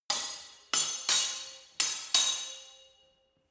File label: sword hit fast sword sequence